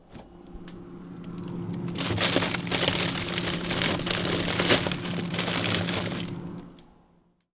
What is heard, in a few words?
Foley